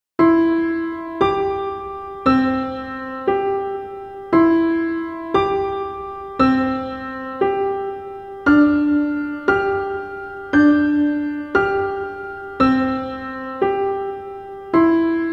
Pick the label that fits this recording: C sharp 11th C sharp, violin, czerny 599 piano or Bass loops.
czerny 599 piano